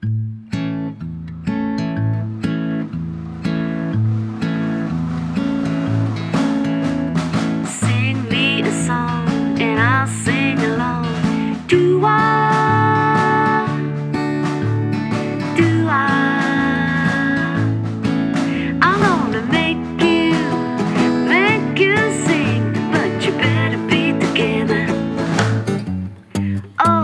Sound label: Doo Wah